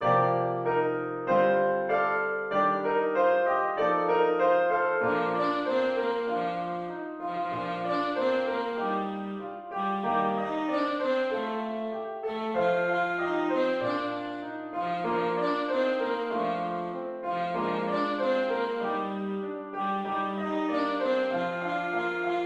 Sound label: jingle bells vive le vent; pierpont james